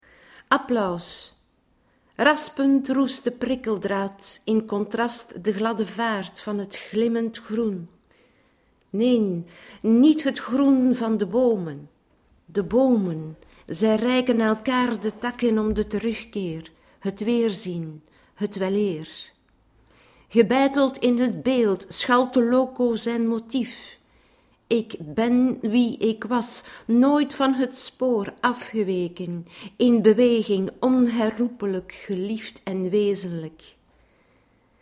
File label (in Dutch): applaus